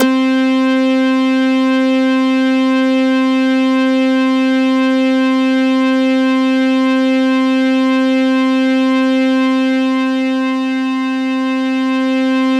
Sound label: C4, Synth Line; 90 s Sample CDs; NiceBeats; SynthLine VOL-14